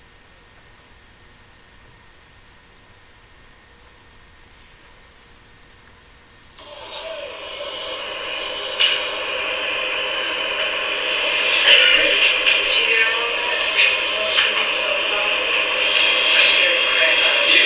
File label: whistle; 1st macarthur; The machinery is so loud I almost missed it. This recorder was on the bar; Springfield Carriage Co